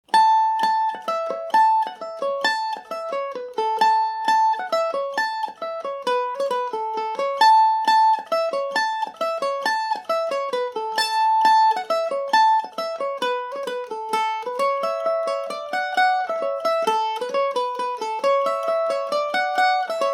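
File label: A major, irish mandolin; mickey chewing bubblegum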